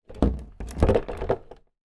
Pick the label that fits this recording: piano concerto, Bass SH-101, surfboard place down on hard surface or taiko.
surfboard place down on hard surface